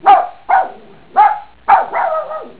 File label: Barking Yapper Dog